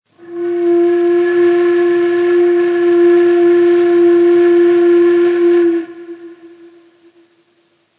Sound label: Pes Beyati, Ney